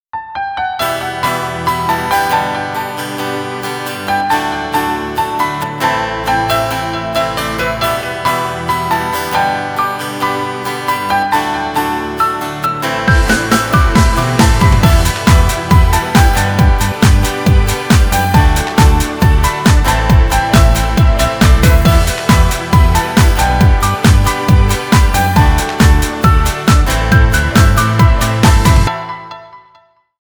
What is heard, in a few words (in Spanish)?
FIESTA GUITARRA